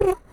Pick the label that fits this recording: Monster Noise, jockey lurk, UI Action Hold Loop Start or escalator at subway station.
Monster Noise